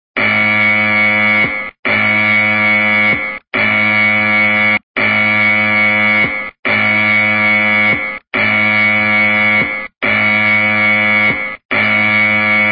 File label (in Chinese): 防災警報